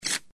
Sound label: Card; blackjack3